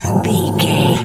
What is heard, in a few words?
dog growl